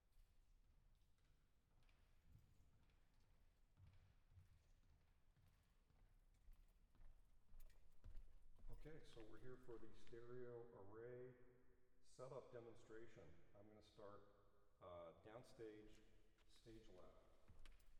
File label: PNO